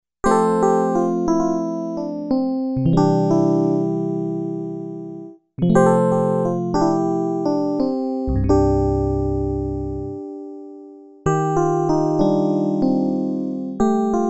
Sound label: FM Tines